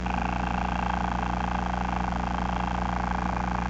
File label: bass, roller canary